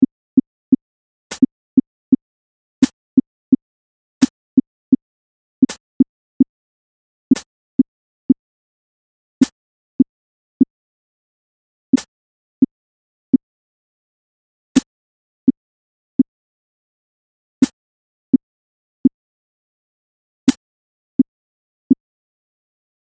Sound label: anapest with rubato handclap